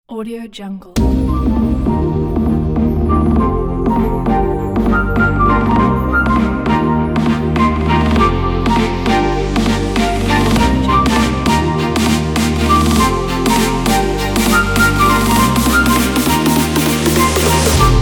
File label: fun future bass; colourfulsounds